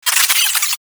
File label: space drone death; Enemies; Warframe; Corpus Space Drone